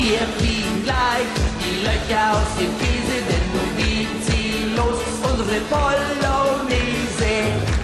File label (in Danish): Tysk Schlager